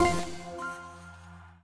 quick Spin; twin happiness

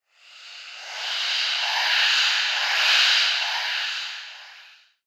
heartbeat; superstriker

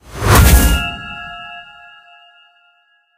splash; cash noire

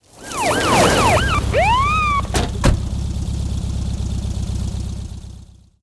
Police Car Approach; narcos

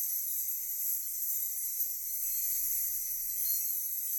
sparkle; hellskitchen

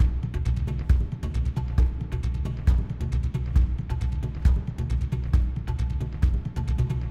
free spin intro loop; rise of maya

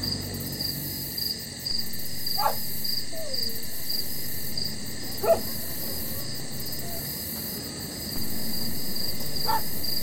Sfx Background; narcos

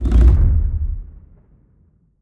nudge step; rise of maya

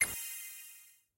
count up loop end; vegas night life

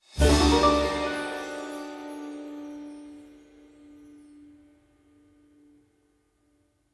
win Small; wild bazaar

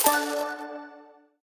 count Up End; alohaxmas

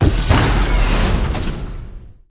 paytable Entry Animation; wildotron 3000

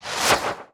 multiplier Zoom Out; starburstxxxtremeusa

